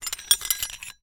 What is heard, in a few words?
Hole Saw Rattle, Hole Saw Bits